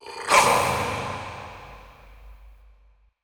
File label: Processed Battle Shouts, Viking Vocal